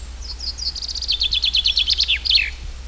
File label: call, chaffinch, full song. - SEX/AGE: adult male; Lake (an artificial basin with a dam); ALTITUDE: +70 m.; fringilla coelebs